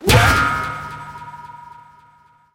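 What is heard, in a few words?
kill scene ricochet, tanks; War Thunder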